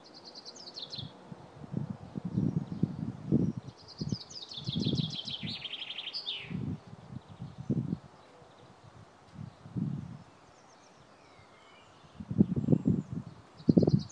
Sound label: lovely chaffinch song